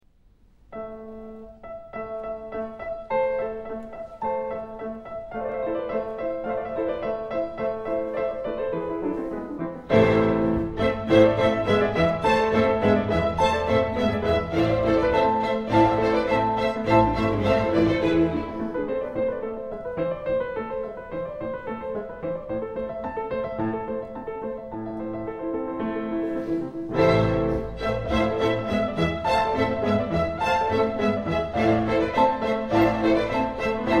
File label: Concerto 3 Pianos